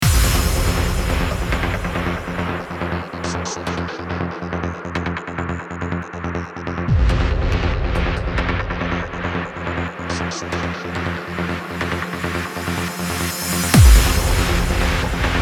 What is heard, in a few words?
Ableton Trance FX